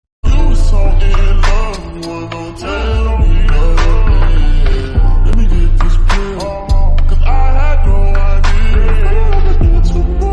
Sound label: slowed ringtone music beats